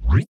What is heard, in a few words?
popup